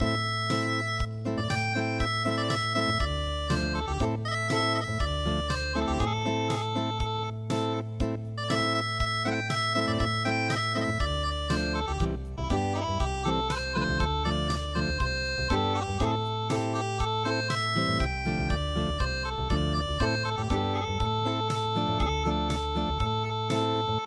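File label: sooranbushi unplugged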